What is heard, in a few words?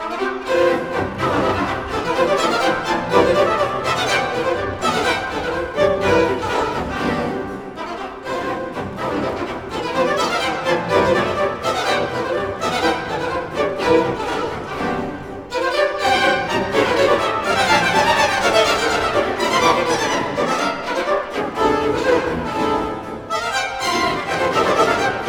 unpleasant Bourree